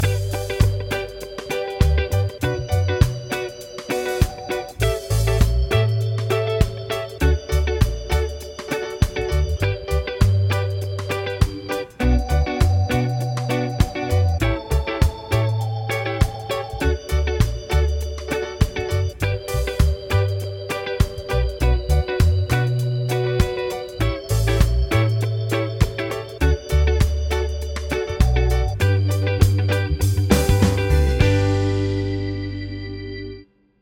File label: progs with applied chords